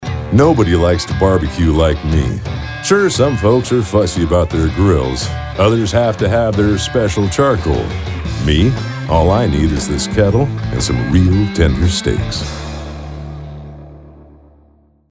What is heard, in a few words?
Kettle